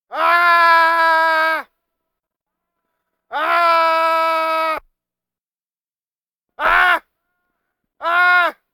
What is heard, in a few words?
Vocal effects breathing Moaning Baby